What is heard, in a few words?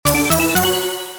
win